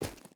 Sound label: footstep rubber boots gravel run; Rust